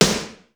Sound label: nasty snr; sr16 sd